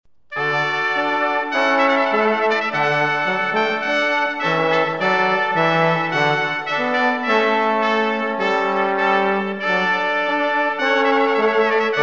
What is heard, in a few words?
brass quartet; Prince of Denmark; aka Trpt Voluntary